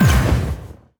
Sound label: verdant blast impact; heroes nyru